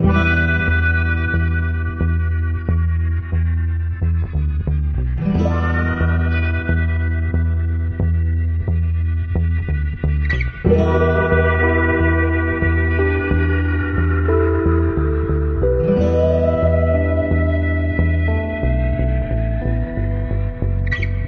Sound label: guitar, Loops Phonky